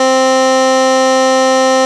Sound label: string